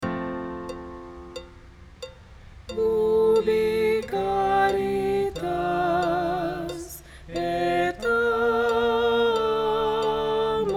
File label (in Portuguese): soprano; Ubi caritas